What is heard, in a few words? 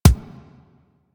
Weapon Punch Pistol